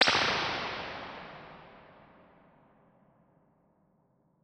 Springs Long Stereo Spring, ImpulseResponses; Ableton Live 12 Lite; Hybrid

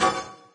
game got points; There; ThereClient; gamekit; gunkit

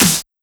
Snare Heavy, drums one shots

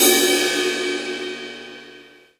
Ride; Drums; Ableton Live 12 Lite Core Library

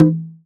Conga Acoustified Low; One Shots; Drums; Ableton Live 12 Lite; Core Library

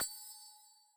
elevator interact start; puzzle

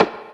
Rim; Drums; Ableton Live 12 Lite Core Library

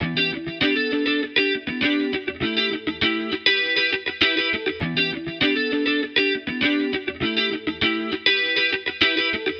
Guitar E 100 bpm; Loops; Tonal; Loveline TL; Ableton Live 12 Lite; Core Library

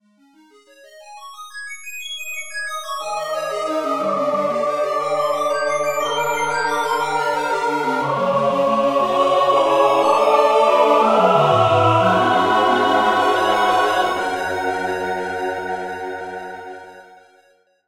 bgm power up; ZeroRanger

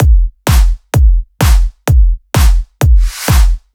Straight EDM Beat 128 bpm; Loops; Ableton Live 12 Lite; Core Library; Drums

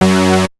ARP Poly Lead - F#2, Synths; Multisamples; Ableton Live 12 Lite; Core Library

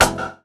Snare, drums; Ableton Live 12 Lite Core Library

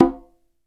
Conga Low Slap; One Shots; Drums; Ableton Live 12 Lite; Core Library

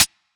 Hihat Closed

